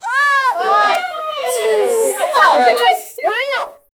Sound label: kids reacting, reaction